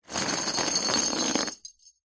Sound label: industrial chain med pick up from wood panel; zapsplat